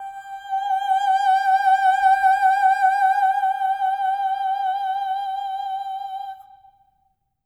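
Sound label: soprano "oh" G5; Spectrasonics; Symphony of Voices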